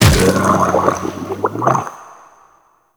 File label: Inked Humanoid Large Death; Enemies; Warframe; Shadowgrapher